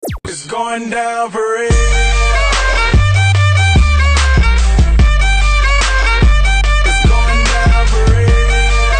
outro

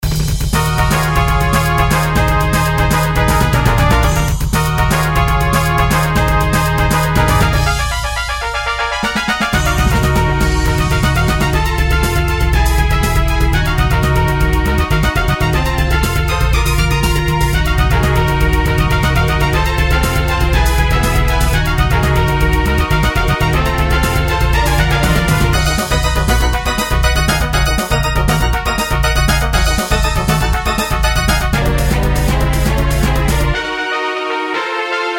Brass Organ Strings; Castlevania III; Aeternus Brass; Organux; Syntheway

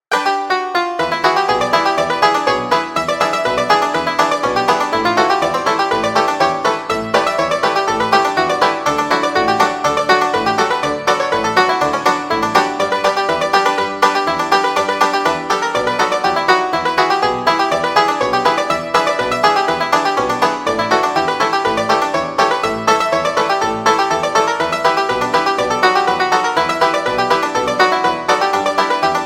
Banjolin; Banjodoline

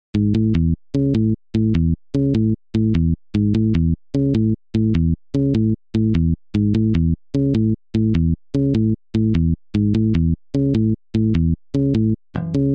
Muted Bass; Bassesland; Syntheway

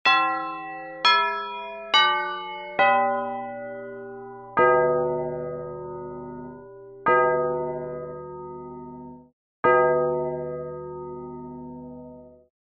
Carillon Bells; Drumwavy; Syntheway

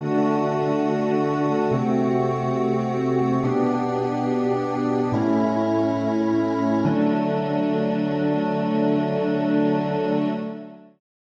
Synth Organ; Organux VSTi v1.1; Syntheway

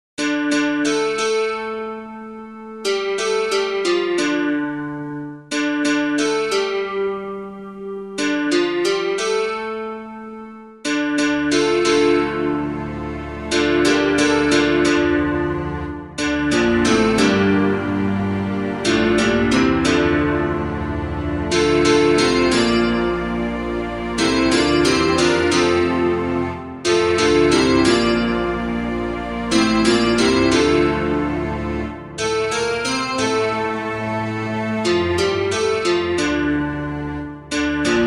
American Folk Gospel Electric Mandolin; Banjodoline; Syntheway

